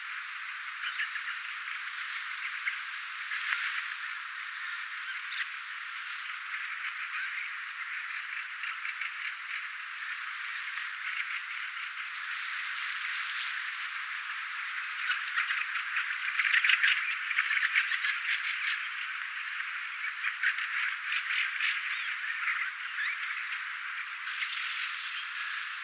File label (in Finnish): korkeampia aania kuuluu rastasparvesta kaikki ovat ehka rakattirastaiden aania